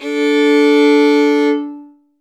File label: D3 fifth, fiddle; InVision Interactive; Lightware VOL-1; Instruments & Percussions